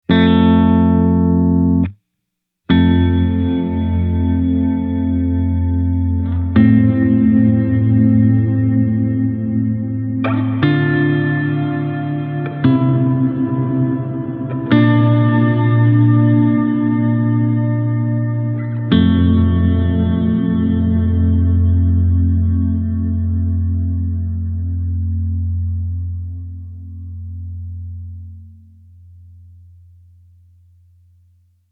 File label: Modulation Modulated Big, reverb